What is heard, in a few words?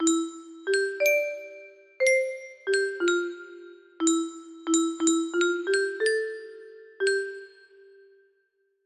music box melody